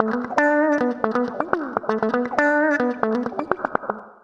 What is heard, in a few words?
Acoustic Guitar Loops, 120; USB Soundscan vol.04 - Electric & Acoustic Guitar Loops; AKAI